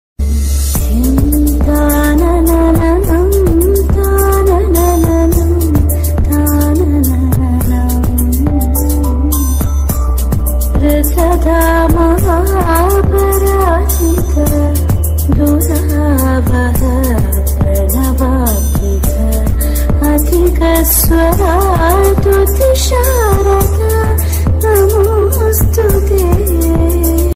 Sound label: popular malayalam ringtones love bgm tune mobile ringtone